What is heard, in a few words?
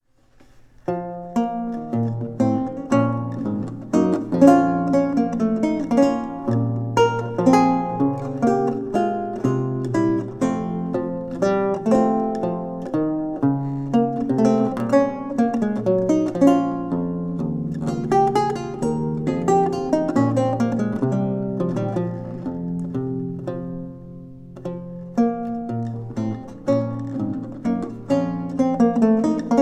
Courante; Belleville; Cherbury